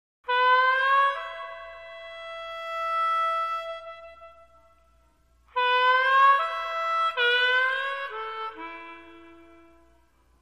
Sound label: Harp